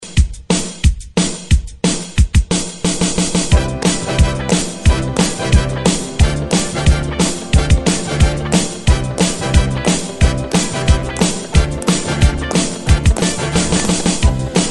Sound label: 60s Re Drum 92 Bpm; DJDOLLS